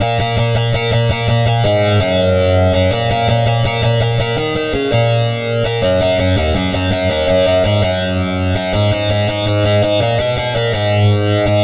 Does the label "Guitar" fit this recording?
Yes